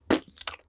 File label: Splat